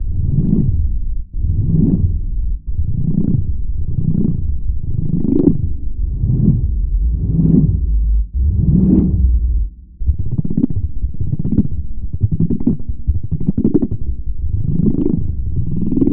SFX Bacta Tank; Routed Sound; Serum 2 Expansion - Quantum Enforcer Vol.1

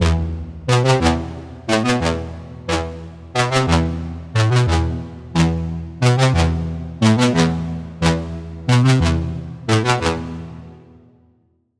Lead; Routed Sound; Serum 2 Expansion - Noble Gangster Vol.1

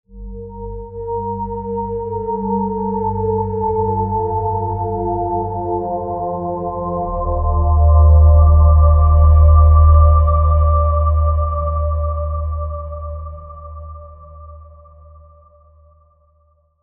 Pad; Routed Sound; Spire Expansion - Flame Summoner Vol.1

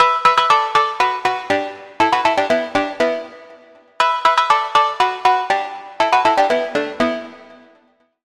Pluck, Sylenth1

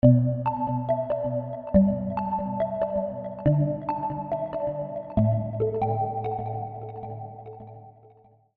Pluck; Routed Sound; Sylenth1 Expansion - Flame Summoner Vol.1

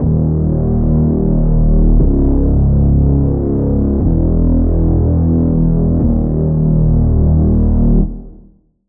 Reese

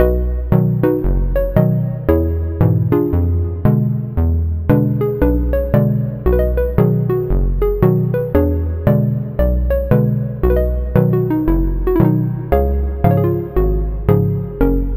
Key, Sylenth1; Routed Sound; Sylenth1 Expansion - Story Walker Vol.1

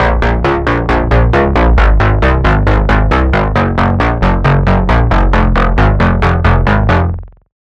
Bass; Future Filter; Routed Sound; Vital Expansion - Reality Maker Vol.1

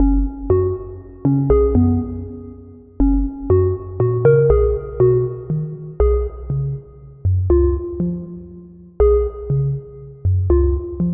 Key; Routed Sound; Sylenth1 Expansion - Story Walker Vol.1

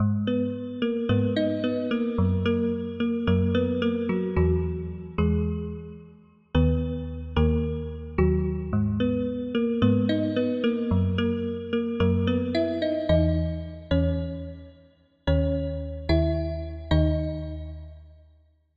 Key

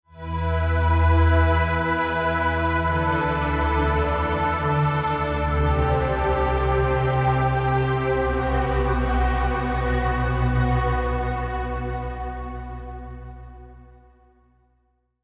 Pad; Routed Sound; Sylenth1 Expansion - Reality Maker Vol.1

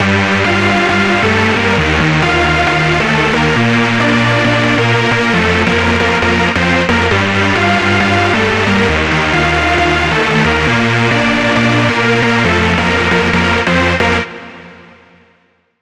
Lead; Flame Symphony; Routed Sound; Vital Expansion - Flame Summoner Vol.1

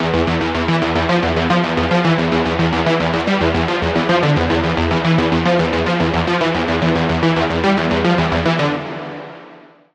lead; Red Dress Devil; Routed Sound; Vital Expansion - Doom Carpenter Vol.1

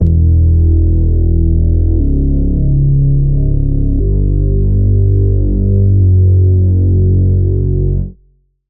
Reese; Lethal Zone; Routed Sound; Spire Expansion; Street Vanquisher Vol.1

